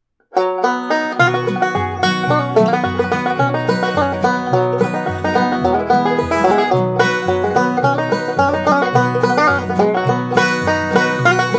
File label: kick off, banjo